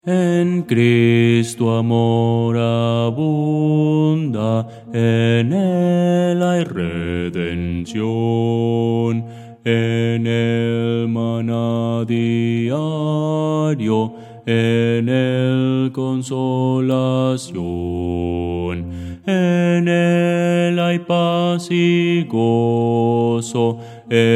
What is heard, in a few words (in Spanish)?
bajo; en cristo amor abunda; Voces para coro